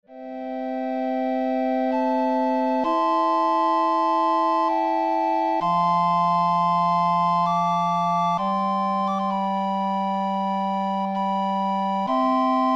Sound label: Recorder Ensemble